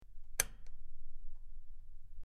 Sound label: Rotating Switch Clic